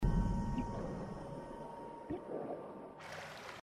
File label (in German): atmungshinweis; inside